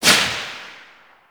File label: SMG Tail Indoor; Warframe; Tenno